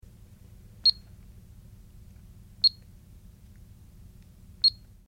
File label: Alarm Code Beeps Rep; hi-tech various